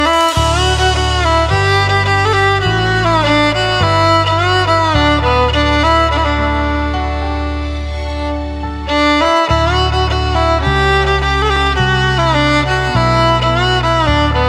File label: Violin Ringtone; Tiger Zinda Hai